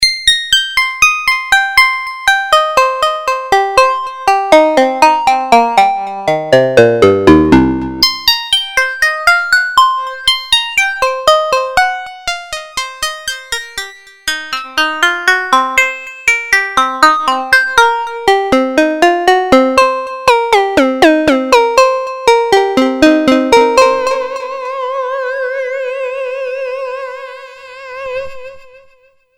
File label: plugged pulse